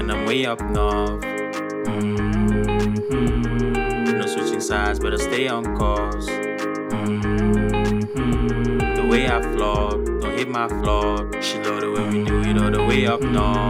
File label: DRY